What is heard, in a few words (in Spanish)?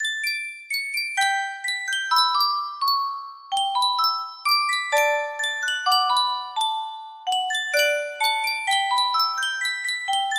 Sankyo caja de musica, music box melody